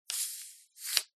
cola bottle plastic open fizz; zapsplat

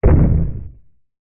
fantasy giant single footstep distant heavy wood

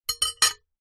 household knife place down on plate; zapsplat

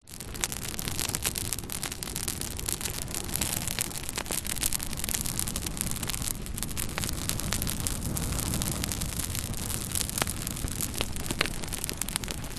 nature fire camp fire burn sizzle in wind; zapsplat

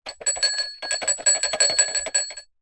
household alarm clock with bell shake

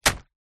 sport swimming cap latex drop ground; zapsplat

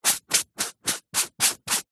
office sponge brush strokes on paper; zapsplat

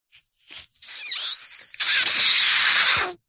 cartoon suck forced big squelch

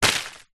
tree leaves branch thin hit; foley; zapsplat

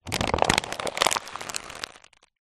plastic fruit tub packet scrunch up; foley

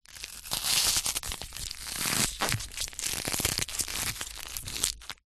ice pop movement break squeeze; zapsplat

